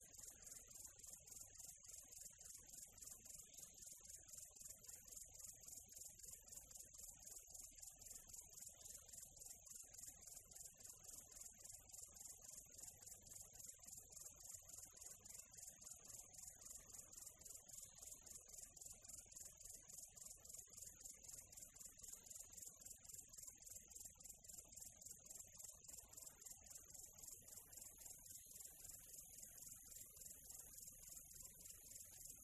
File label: NATURE Quiet Tropical Atmo; smartsound